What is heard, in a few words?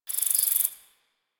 Cricket; Warframe; Quests; The New War; Ambience; Zariman